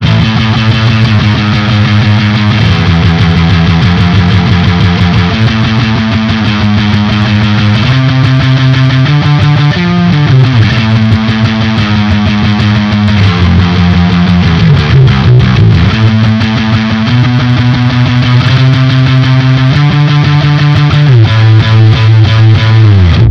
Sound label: bass fuzzy